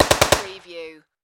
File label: Submachine Gun Sterling